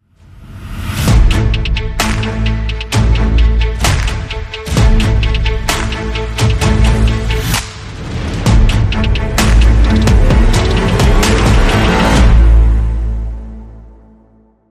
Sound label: Hybrid Sting